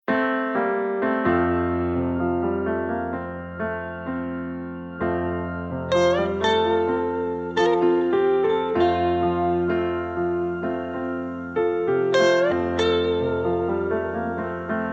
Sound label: F No Backing Vocals, backing tracks